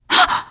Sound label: reaction startled, teen girl; player; survivor; voice